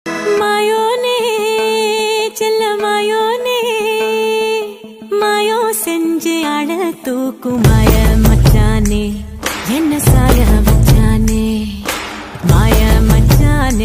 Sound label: tamil song